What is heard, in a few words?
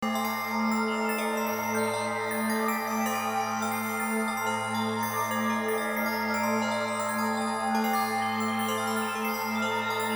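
bgm kane, bell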